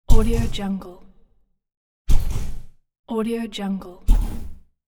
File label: robotic hydraulic shift